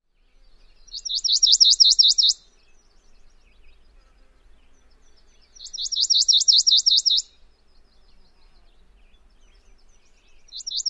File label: songs